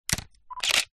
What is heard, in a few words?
camera dslr flash pop up shutter take picture nikon d5200